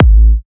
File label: kick g